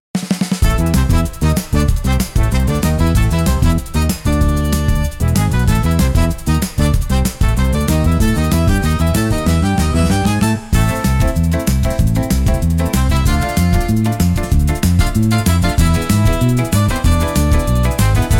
Carnival Stampede, upbeat carnival; for festivals and parades

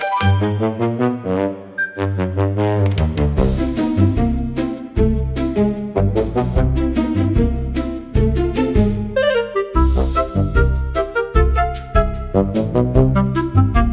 Awkward Tuba Triumph, Comical Orchestra

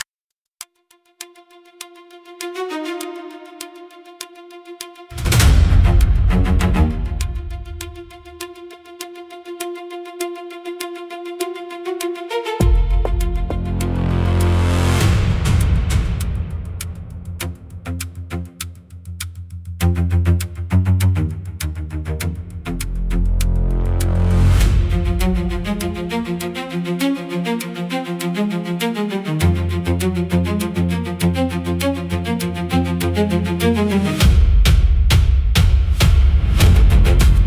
Ticking Glass, high tension suspense

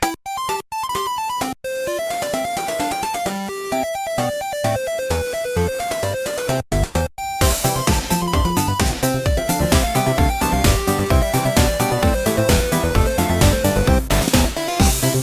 retro game chip tune; pico pico sound

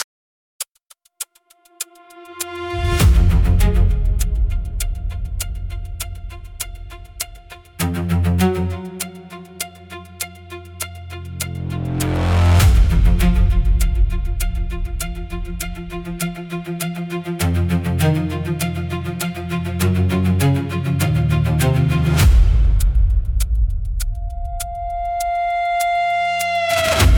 Ticking Glass, high tension suspense

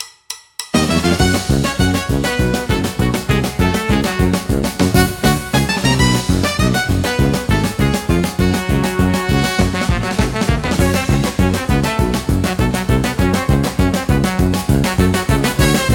Carnival Stampede, upbeat carnival; for festivals and parades

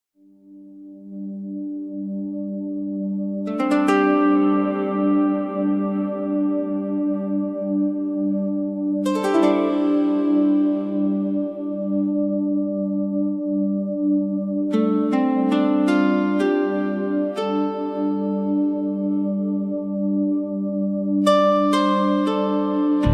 Stone Lantern Rain, quiet japanese ambient

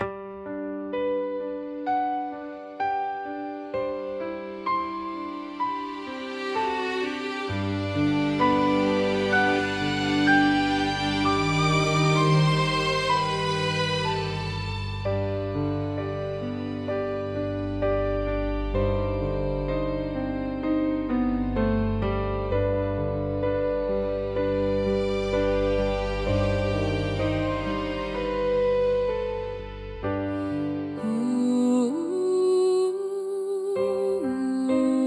inspiring piano strings for story climax

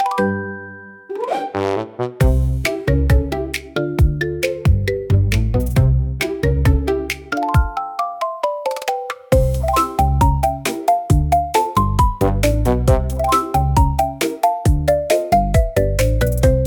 Marimba Parade, comical marimba for funny scenes

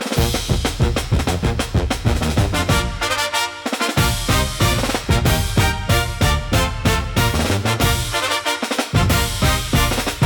Second-Line Stampede, upbeat carnival; for festivals and parades

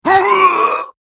"Ugh", samurai voice; samurai voice selection 2

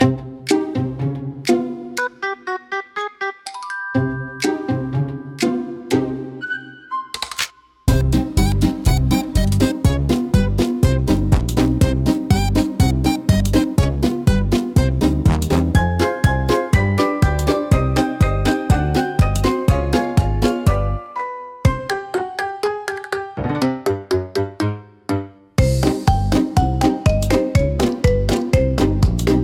Marimba Misstep, comical marimba for funny scenes

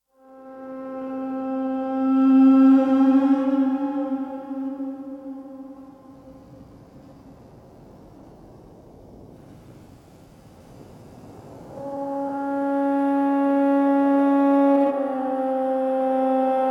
Glass Hollow, spiritual spooky soundscapes

